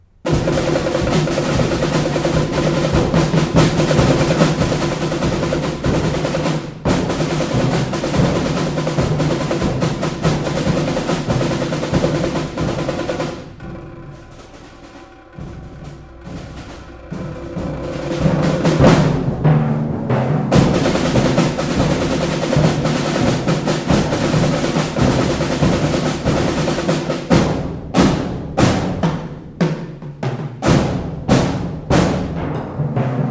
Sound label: Soundscape, Percussion Ensemble; FCC